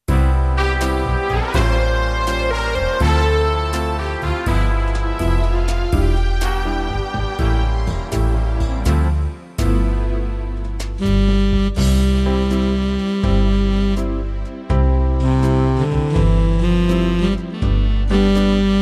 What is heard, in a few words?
Slow and Saxy